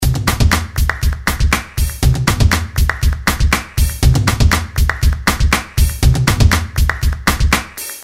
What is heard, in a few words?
rhythm Gipsy Rock; Sound Graph